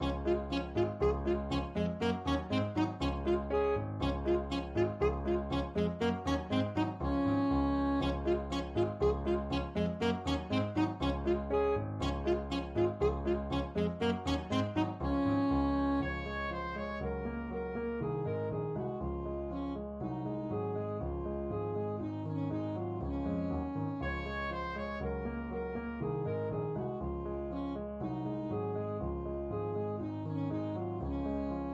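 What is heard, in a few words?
ecossaise, alto saxophone; hummel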